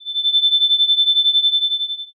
shiin

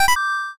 nikkori

